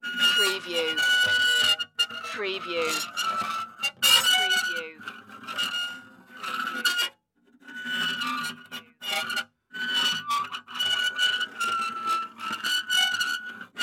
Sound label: annoying metal squeaks